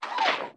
Panel Arm; XWing

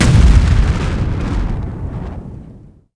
explo; Starsiege

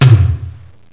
tom2 (low analog); Octamed 6; walkabout; analog perc

